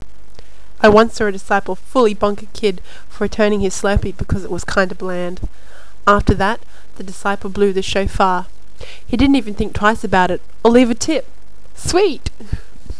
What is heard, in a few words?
shofar